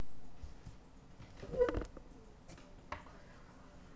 whistle

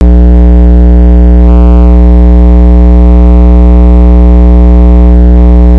bottle

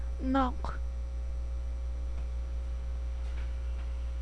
knock

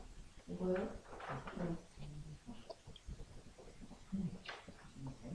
noise

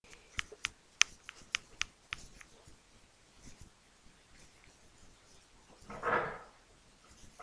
harp

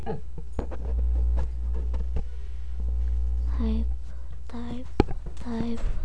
knife

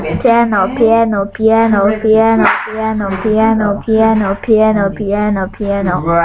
piano

